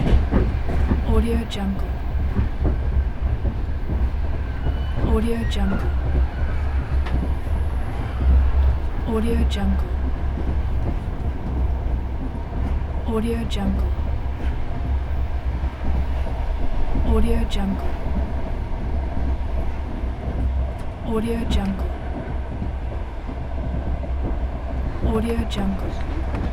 inside a train carriage; albasfx

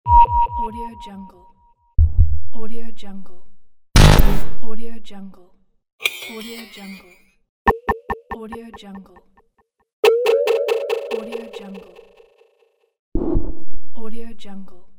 futuristic ui sounds; volkovsound

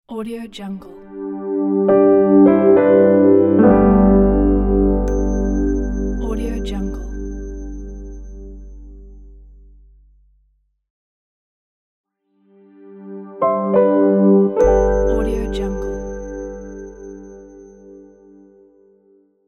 piano smile logo; abcdmusic

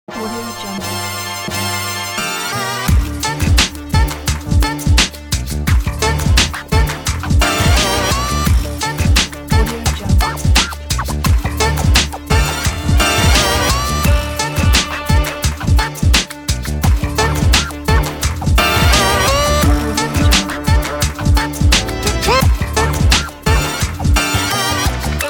hiphop logo

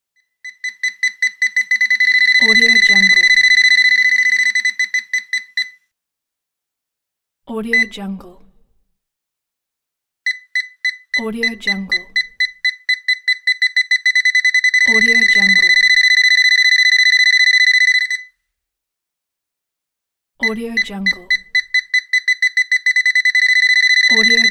bomb timer beeps

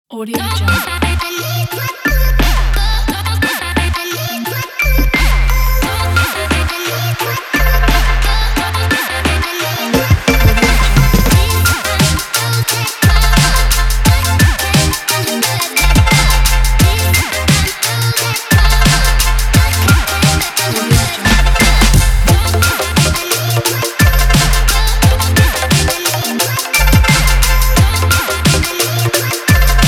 children hiphop

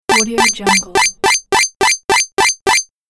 point hits

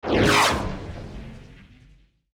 short small spacecraft fly by; sound ideas

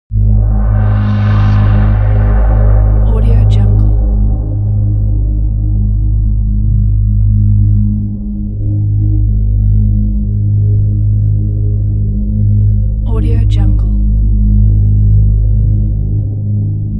atmo drone; alias; smartsoundfx

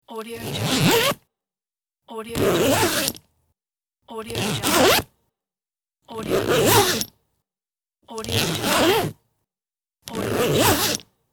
zipper sounds

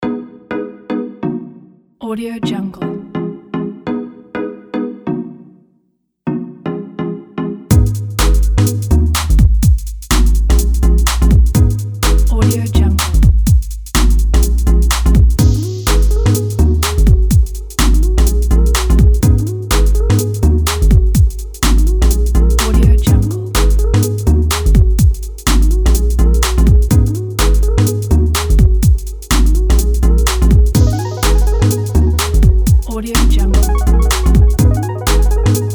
commercial break beat background

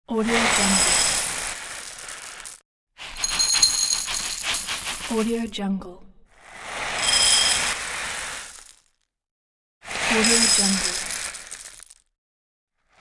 cereal pour in bowl

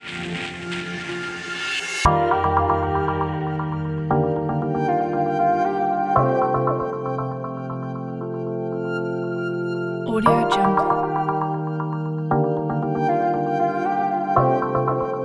mechanism

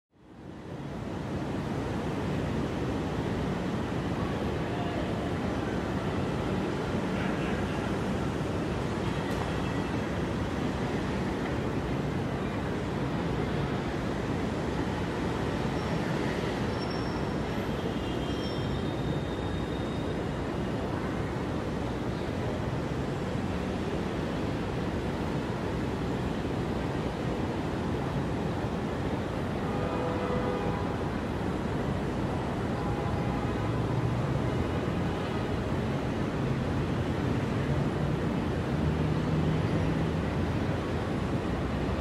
city

